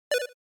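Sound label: Game Boy Racing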